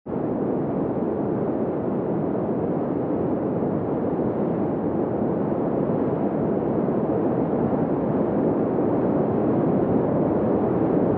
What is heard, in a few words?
snow, ambiance